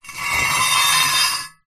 industrial metal heavy gate post pounder scrape concrete ground